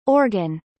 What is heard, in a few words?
organ